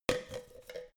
plastic handle

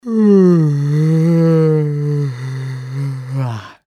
yawning

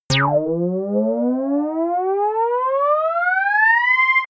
arcade rising

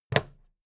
plastic clasp close regular

